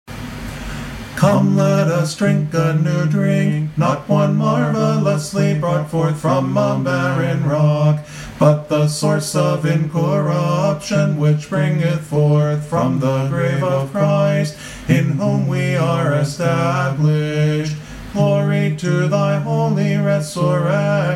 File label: paschal canon ode